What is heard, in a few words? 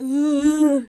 VOX, VOCALS; Ueberschall; Jam Box